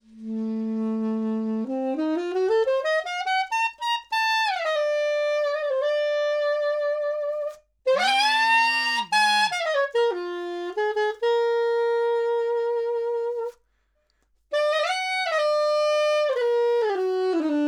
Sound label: Sax Soprano 20cm High